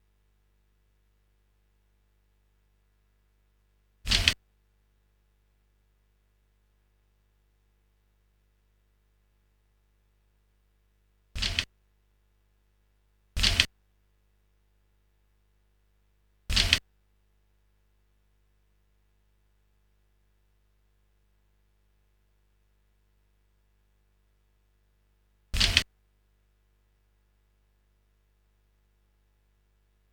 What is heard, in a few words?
stereo instr walkman loop